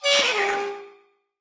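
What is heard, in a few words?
Woodwind death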